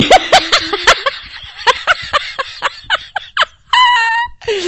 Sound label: Laugh Lachen